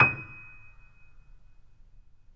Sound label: Mp D#6, Grand Piano; AKAI S6000 CD-ROM - Volume 2; Grand Piano 256M